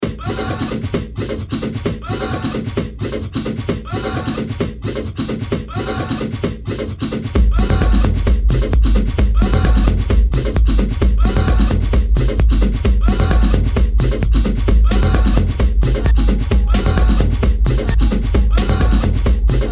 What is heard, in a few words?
ringtone